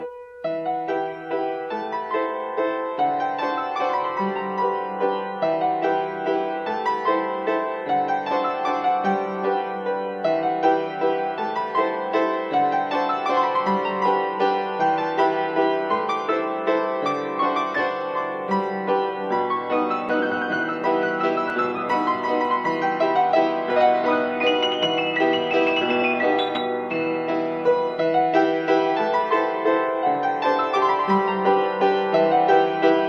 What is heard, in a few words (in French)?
chopin valse 9 en la mineur; polaris